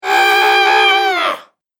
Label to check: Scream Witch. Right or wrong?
Right